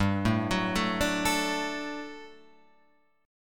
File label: G Major 7th Suspended 2nd G down Guitar Open F; GM7sus2 arpeggiated